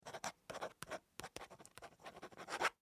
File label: Office Pen Write On Cardboard; Pens; Offices